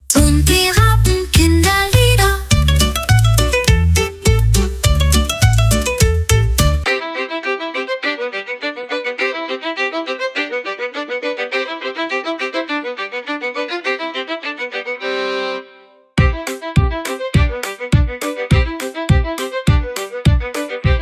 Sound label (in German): instrumental; die vogelhochzeit; kinderlied